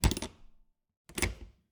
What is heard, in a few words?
Refridgerator, old fashioned, wooden, steel, brass clasps, hinges, close open, wide; 2 mics; Eiravaein Works; Vaeyan IV